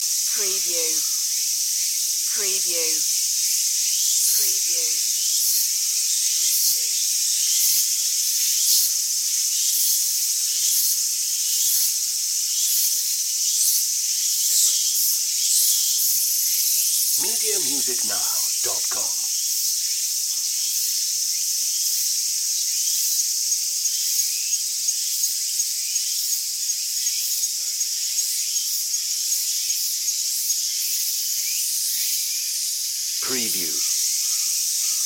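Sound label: ambience insects birds Tsumago Japan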